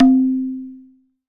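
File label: TABLA left; WorldSounds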